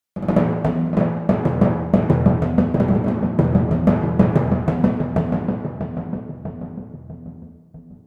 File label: Timp arollin; Torchlight III; PS4